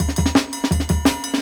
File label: Burble; 90s Sample CDs; Original Jungle Breaks